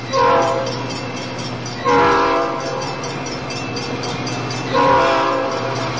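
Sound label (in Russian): гудок поезда и стук колес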